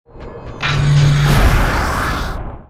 mode on

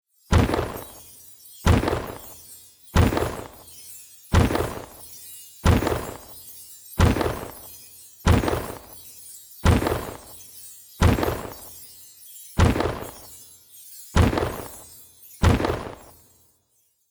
Fairy Dragon main

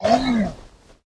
minotaurus damage, monster

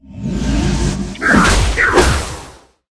minotaurus attack, monster

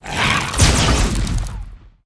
poison plant attack; monster

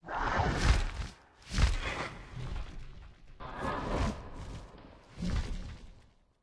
peryton idle, monster

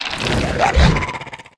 zombie attack, monster

